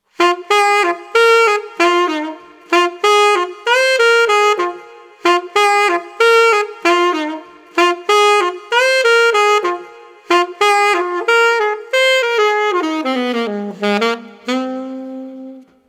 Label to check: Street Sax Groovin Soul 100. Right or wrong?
Right